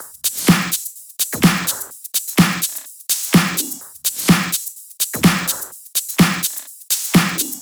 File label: Thai Kit 6, 126BPM; Vengeance Samples